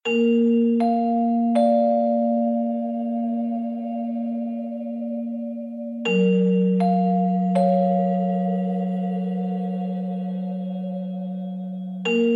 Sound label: xilo